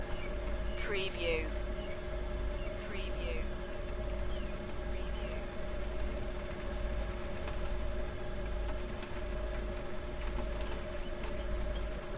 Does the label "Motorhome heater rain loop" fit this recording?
Yes